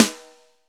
SNR, Snares; Roland L-CD701; Sn Modules 6

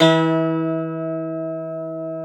CIST E 3, cister; SoundBytes; Early Patches

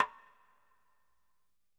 PING, x-sticks; Sampleheads; New York City Drumworks VOL-1; KD X-STICKS

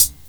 HH, Dance Mega Drums; Best Service; HH-HIP

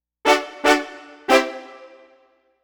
Brass Stab; Synthetic Winds; Dr. Fink's Funk Factory

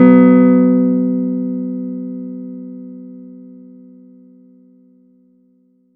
Chord; ModelCycles

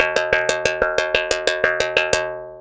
FX SWEEP, SYNTHSWEEPS

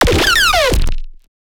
Bass shot

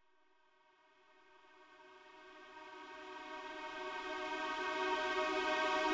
Riser C#; 99Sounds x Monosounds

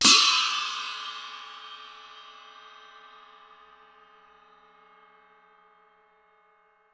XIA CYM; INIS - Opium; PERCS-KIT

